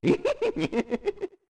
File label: Laughing